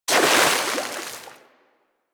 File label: Water Sprayer Shot; Warframe